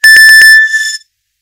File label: Nokia Sms Original Ringtone